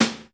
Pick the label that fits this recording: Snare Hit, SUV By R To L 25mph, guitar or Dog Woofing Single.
Snare Hit